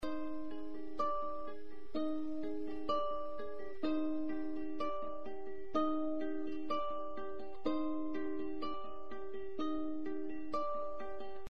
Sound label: story music